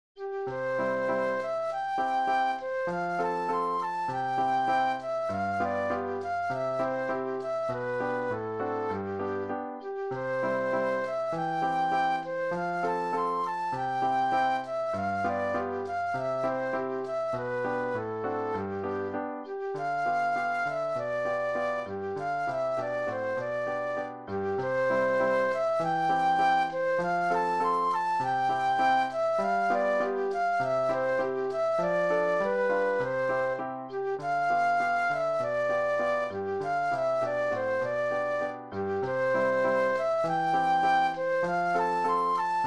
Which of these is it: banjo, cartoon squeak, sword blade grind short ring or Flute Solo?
Flute Solo